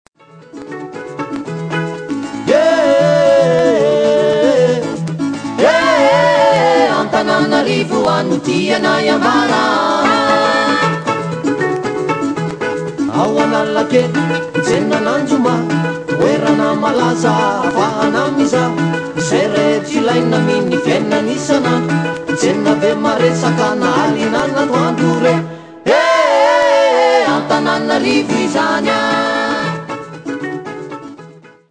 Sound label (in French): musique